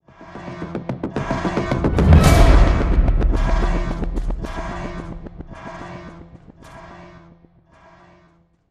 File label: SYNTH LEAD; transformers war for cybertron gamerip